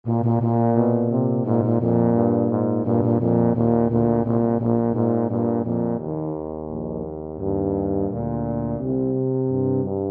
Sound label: anchors aweigh 2 TBA, tuba duet